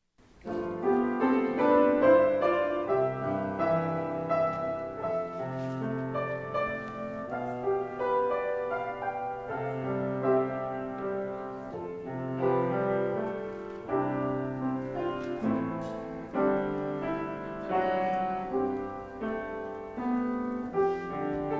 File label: great is thy faithfulness piano